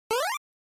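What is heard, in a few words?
Game Boy General Pitch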